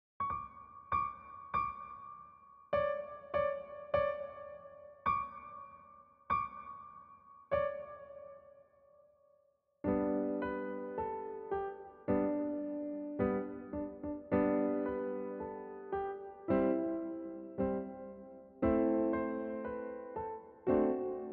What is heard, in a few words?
Jingle Bells